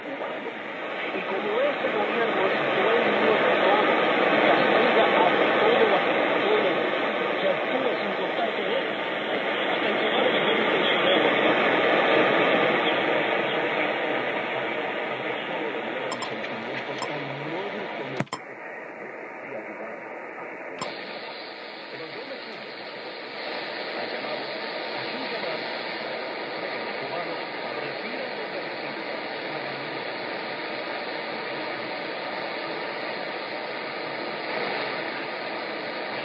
Noizey Test 2, R. Marti, SSB

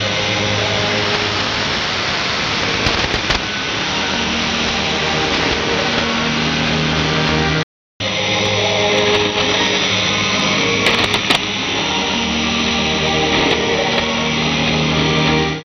3995, DX DSP, weak

